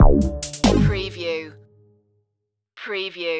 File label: alien pets stinger